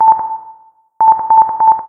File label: cannon beep